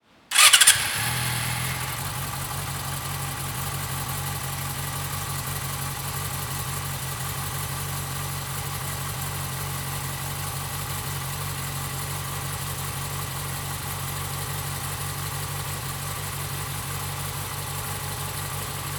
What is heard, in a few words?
Motor Yamaha Fz 600